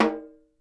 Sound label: SN, Percussion